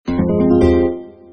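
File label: Sony Ericsson K750i Alert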